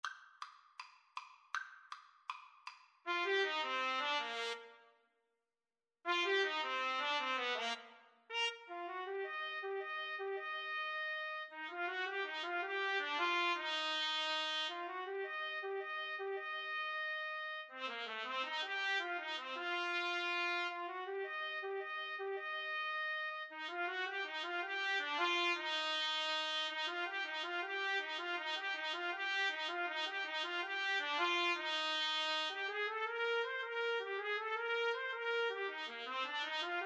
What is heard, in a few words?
trumpet duet